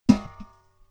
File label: bucket Drop